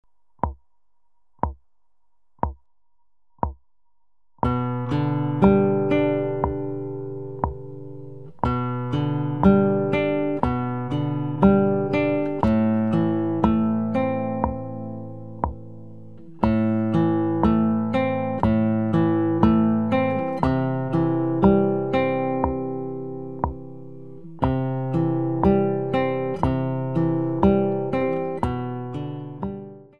picking

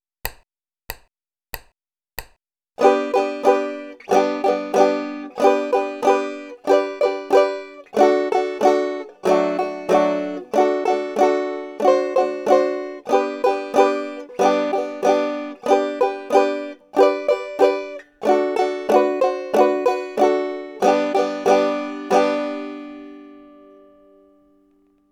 skip to my lou chord melody style

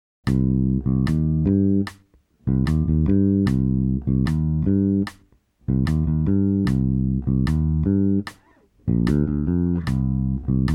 reggae variation

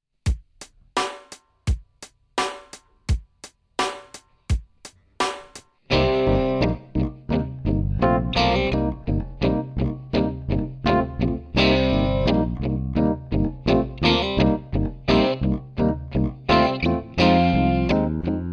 bluesy rockfeel

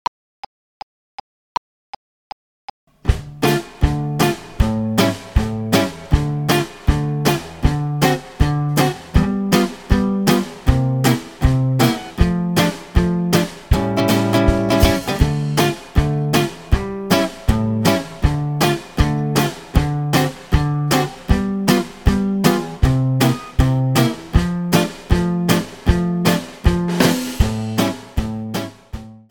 two beat

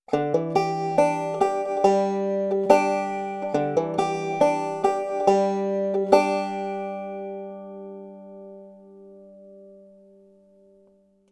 hammer on index lead style

